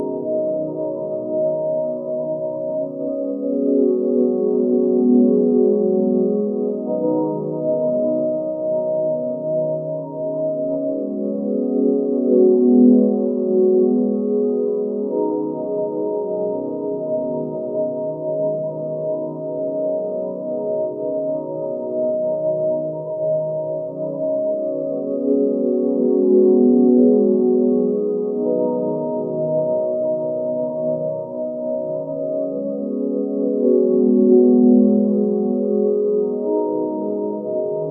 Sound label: style minimalis; minecraft; minimalist